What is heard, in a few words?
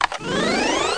Nav Click; Let's Discover Michigan!